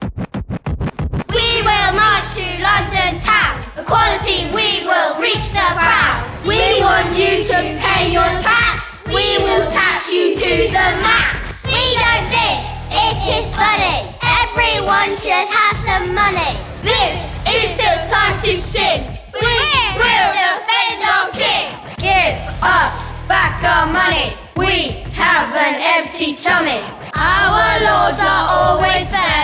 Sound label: Peasants Revolt Rap; petersfield; music and history projects